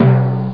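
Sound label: collision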